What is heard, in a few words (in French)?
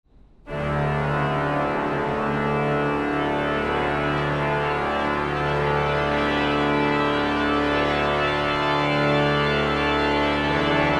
IMPRO grand jeu